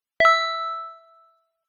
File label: text receive